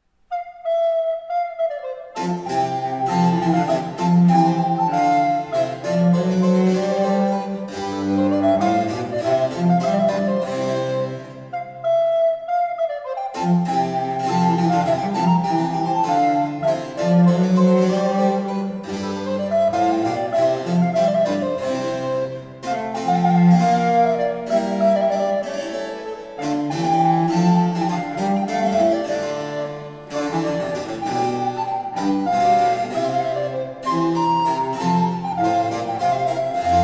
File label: Sonate Minuetto e gavotta